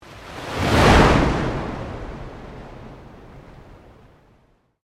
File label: Sweep Organic Slow